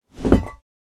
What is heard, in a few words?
Metal fire extinguisher pick up from the floor